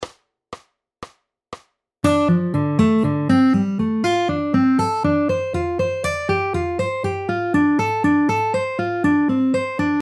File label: Melodic Rhythm Rhythmus Beispiel